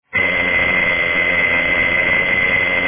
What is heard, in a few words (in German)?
junghans